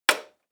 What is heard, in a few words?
Electric fan plastic button switch on; appliances